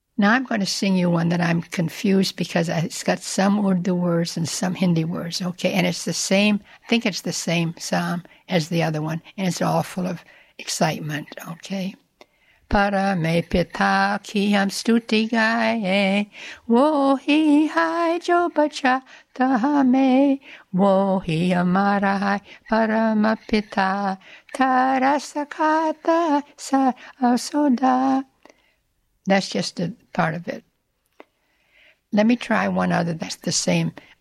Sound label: hymn